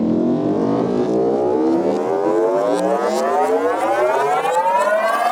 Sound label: load, weapon; wormux